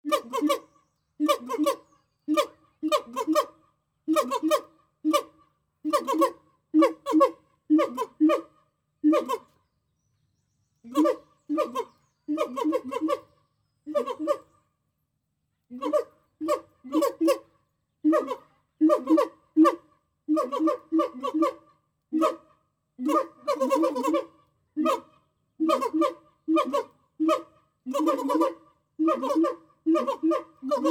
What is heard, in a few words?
gibbons